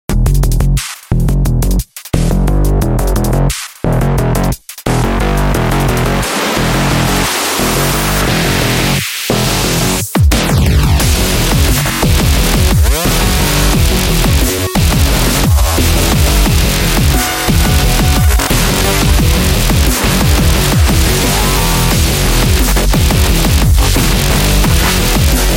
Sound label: Dubstep Evil